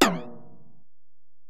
Tom, Alesis DM-PRO

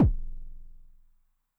Bass Drum Pitch Depth, 909; classic machines; 909mod

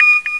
High Beeps; Urban Shakedown

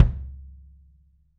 KICK, 3D Drums; DMAL KICK

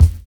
JABO KIT; Univers Sons

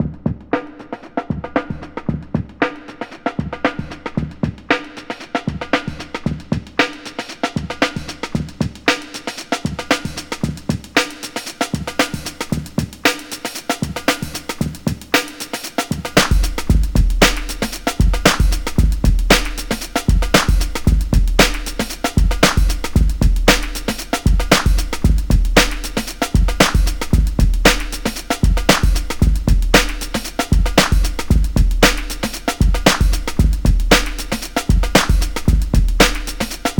115 Funky Bassy, Breaks; Lockdown Breaks

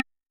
Perc Wood High, Vermona Mono15 Drums

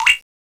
SPROING; FAIRLIGHT CMI IIX; SFX

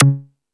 House Machine, Drum Machines

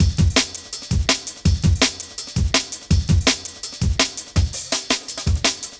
Beat, Futurist Drum & Bass; Drums & Percussion

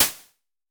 SN Basic, Vermona Mono15 Drums Snare

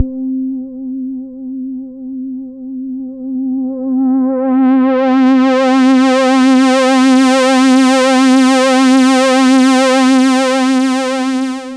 C3, SynthLine; 90 s Sample CDs; NiceBeats; SynthLine VOL-21

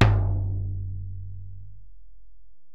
Tom, Alesis DM-PRO, Drum Machines, Toms

